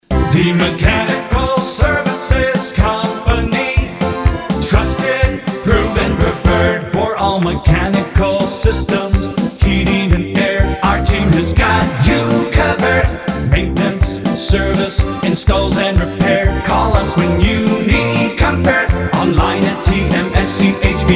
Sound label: Full Sing; The Mechanical Services CO; jingle